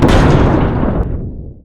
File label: explosion big impact echoes aggressive short fade; imphenzia; Sheriff